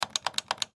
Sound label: Switch On Off, Switch On Off Multiple Faulty; Switches; Objects; Blastwave; Foley